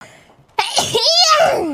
Cute Sneeze; Mococo

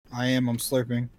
Slurping

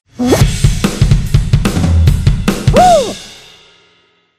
Drum Solo; Fall Guys

